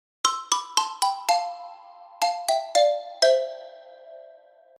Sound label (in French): impro xylo; loto sonore